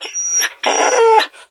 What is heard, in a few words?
hurt, dog